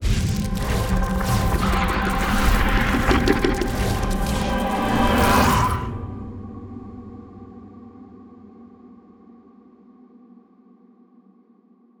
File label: totem interaction; Bael totem